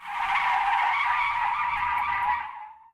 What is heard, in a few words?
skid asphalt; Blind Drive